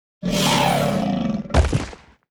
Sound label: war die, Mob; Elder